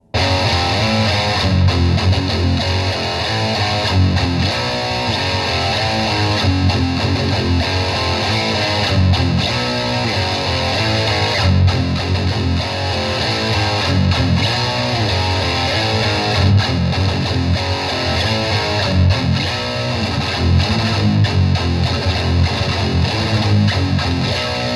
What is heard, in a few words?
metal